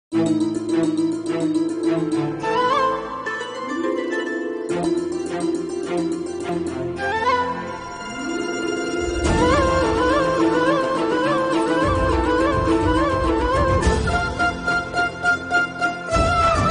intro titles theme serial bgm; star bharat; hotstar

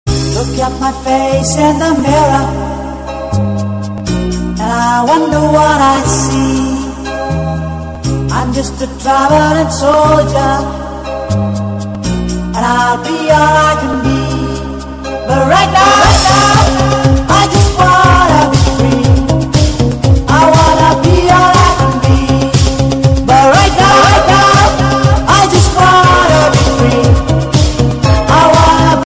travel bgm ringtone

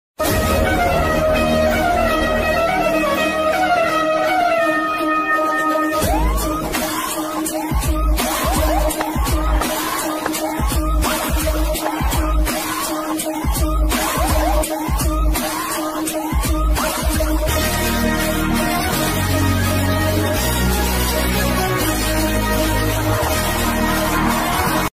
bgm ringtone; Chiyaan 61; Pa. Ranjith; Chiyaan Vikram

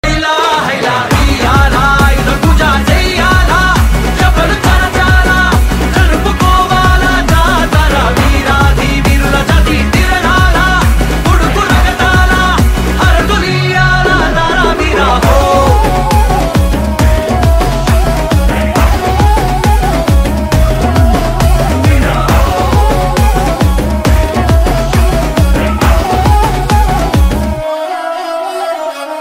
epic bgm ringtone; devara; ntr

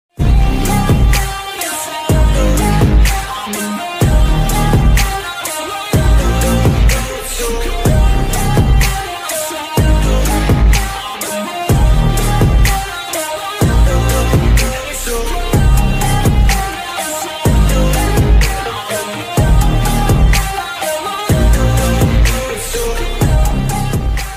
english ringtone

